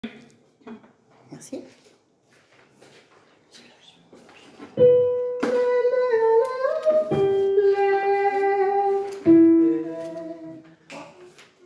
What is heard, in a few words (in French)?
tutti couplet